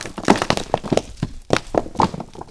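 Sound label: Rubble; egypt; breakout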